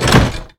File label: hatch Close; rage of the seas